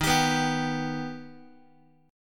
D sharp Augmented D sharp, Guitar; strummed; x,11,10,9,11,x; JGuitar

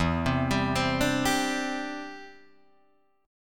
E Minor 7th Sharp 5th, down, Guitar, Drop D

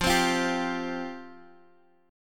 F Suspended 2nd Guitar DADGAD, chord

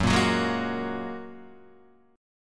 F sharp Major Add 9th, Guitar DADGAD

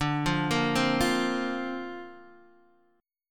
D-Minor 7th Sharp 5th, D, Guitar, Open D

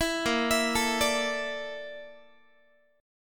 A sharp Major 7th Flat 5th A sharp Guitar; arpeggiated

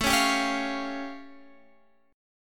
A Augmented 7th A Guitar Half Step Down

